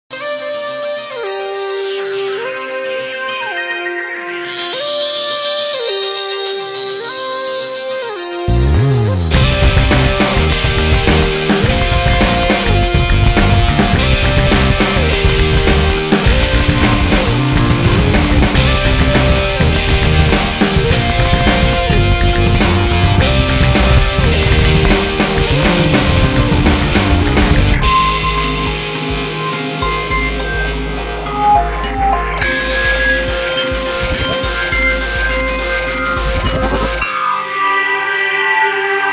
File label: moog